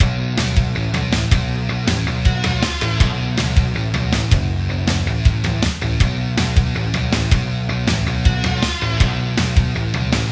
3d Music

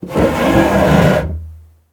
Open drawer; Zenonzard

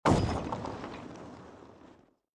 glider land

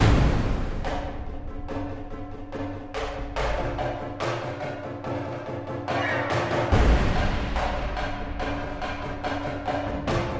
V'Ger Combat Alt Mix

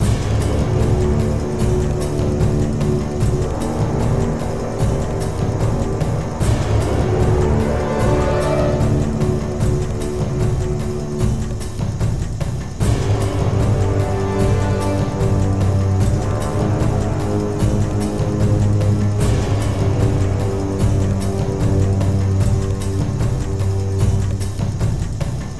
Creature Fight Main; Black & White 2

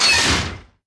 Door; The Denpa Men; Android

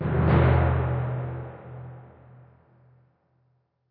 Creep Tymp

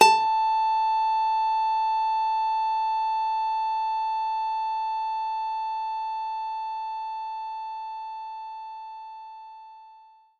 Nylon Guitar A4

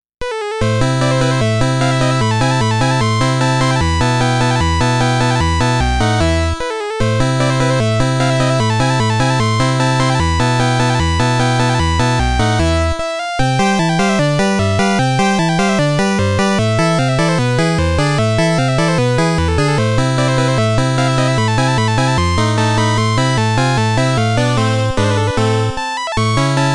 piano- Turkish March; Little Computer People; Mozart

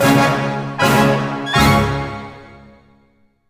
Outro Win Short; GigaTorchWood

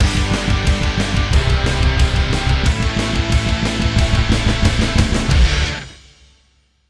sounds music; Halo Combat Evolved; Xbox

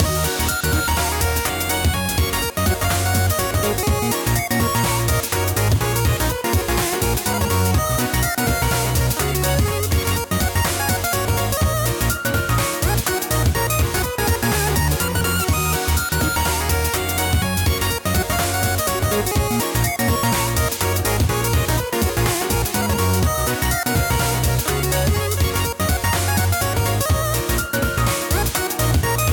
Victory; Echocave; 30XX

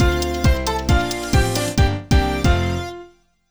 OK (Jingle); Heaven Studio

